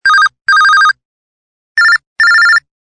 Telephone; KS360

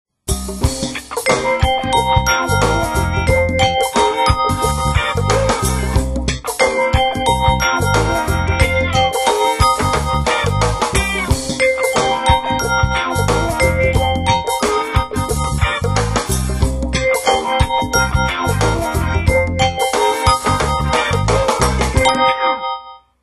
Funky

